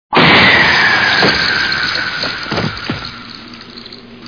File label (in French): implose